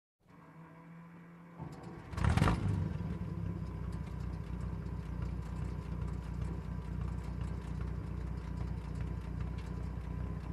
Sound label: Tank driving from outside